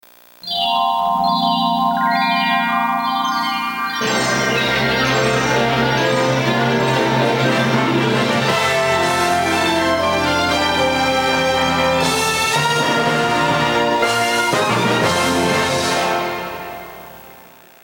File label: fanfare; Paramount